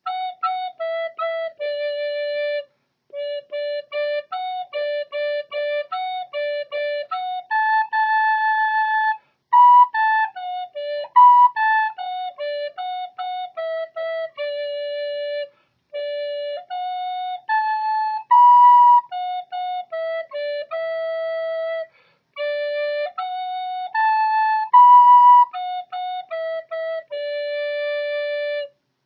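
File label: soprano recorder; Rocky Mountain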